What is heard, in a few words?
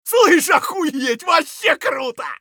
scav cheer; eft